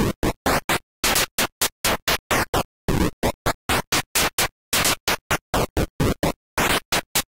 background; tennix

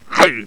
daemon attack; megaglest; megapack; norsemen; battleaxe berzerk

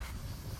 Yarn Roll Start; BattleCats

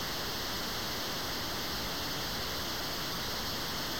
water; gdash

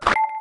nut crack

